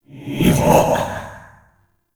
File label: Alt Fire Whispers; Weapons